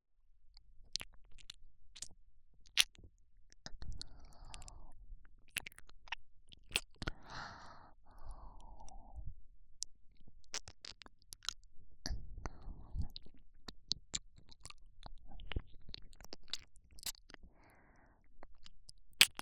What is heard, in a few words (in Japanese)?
甘噛み、耳舐め（右耳）, ASMR